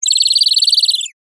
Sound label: cartoon bird chirp